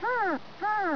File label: DOG, animal; 动物